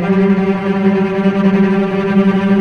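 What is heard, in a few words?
cello G3 tremolo; East West; The Ultimate String Collection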